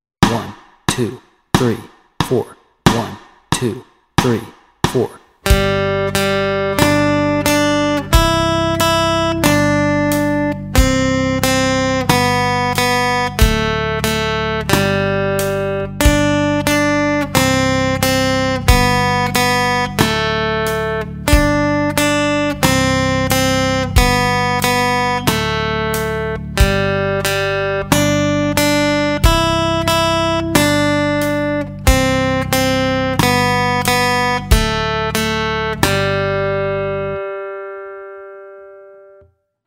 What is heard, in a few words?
Twinkle Twinkle Little Star Melody Track, guitar